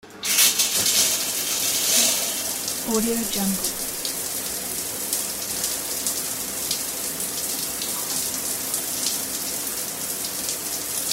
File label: water flows